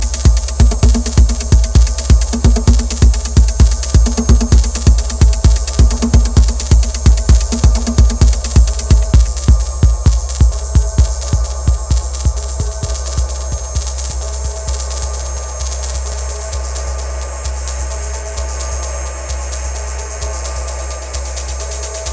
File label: 808 (Hacked)